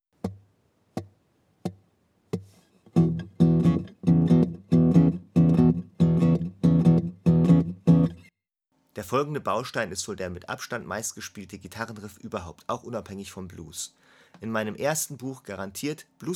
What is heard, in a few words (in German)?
Der Boogie Riff in E; Akustik Bluesgitarre; Garantiert Akustik Bluesgitarre Lernen